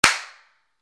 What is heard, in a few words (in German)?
schlafzimmer